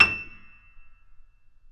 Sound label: Mf E 2, Grand Piano off mic; AKAI S6000 CD-ROM - Volume 2